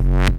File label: Synth Shots; Vengeance Samples